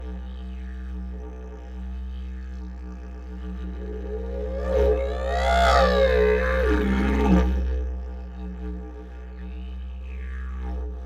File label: Didjeridoo